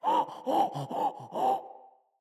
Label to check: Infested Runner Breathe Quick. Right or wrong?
Right